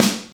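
sharp snare drum A key, steel snare drum tuned to the A note; snare-drum-samples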